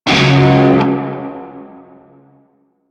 Shawzin Chromatic Chord; Warframe; Narmer Shawzin

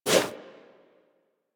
Wall Jump Foley; Footsteps; Warframe; Tenno; Wall Run

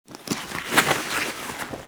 Witch Station Material Texture; Ambience; Soul Plane

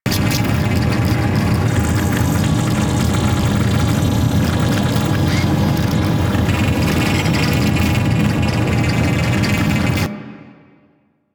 Computer Panel Loop; Venus; Warframe; Veilbreaker; Quests; Ambience; Kahl

